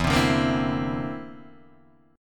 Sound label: D 7th Suspended 2nd Sharp 5th E